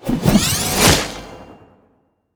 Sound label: reaper harvest; lilith